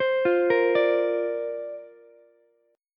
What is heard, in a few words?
D-Minor 7th Sharp 5th, D, Ukulele; Dm7#5; arpeggiated